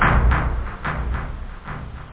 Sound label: house hit, stabs